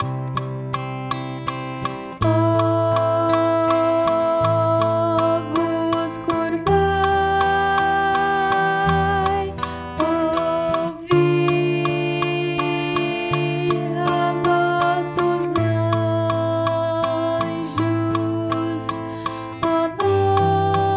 estudo contralto

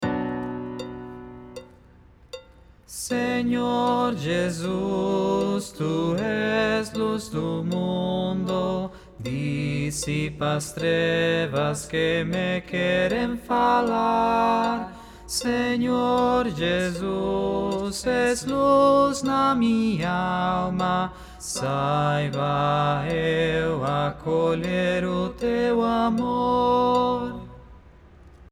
Jesus le Christ soprano oitavado

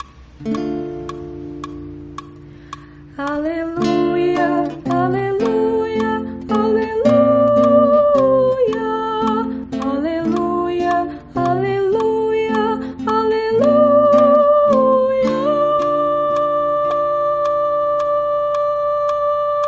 Aleluia soprano

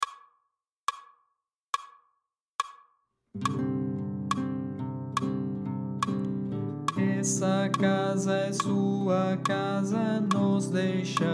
tenor

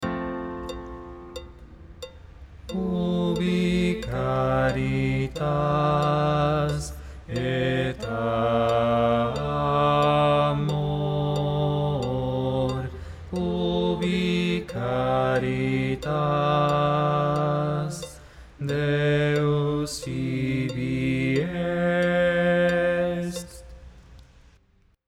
Ubi caritas baixo

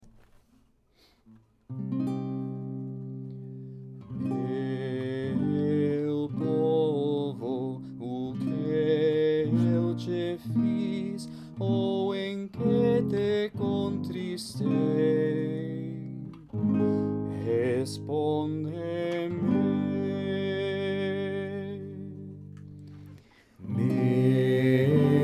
Meu povo Tenor

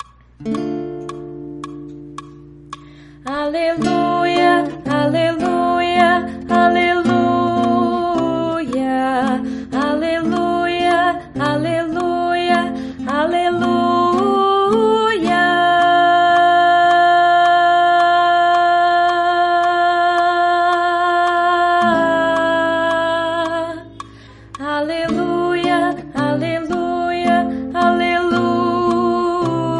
Aleluia contralto

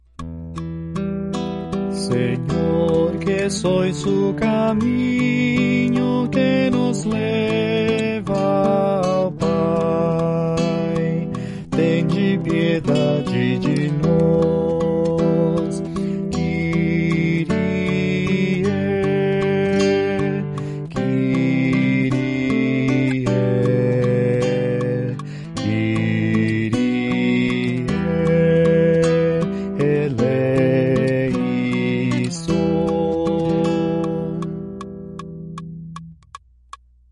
baixo; Ato penitencial; angeles

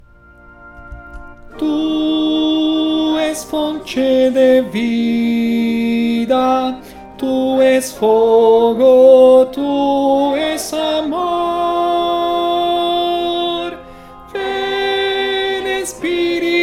Tu és fonte de vida contralto

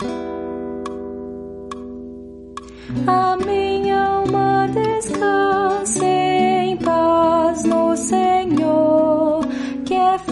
soprano

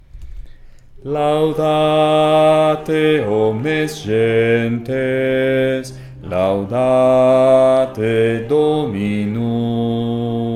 baixo

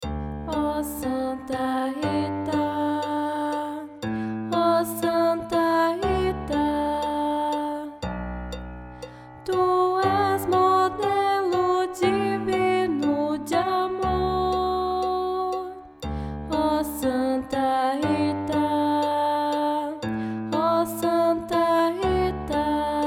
Santa Rita modelo de amor Contralto

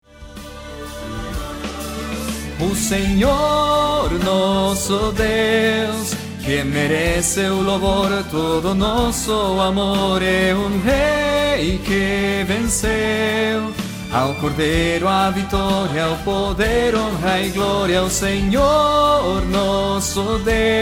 Barítono

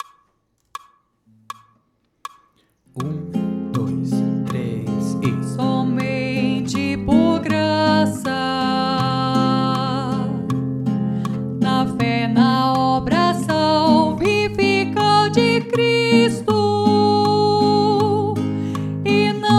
Base contralto; Confessamos juntos

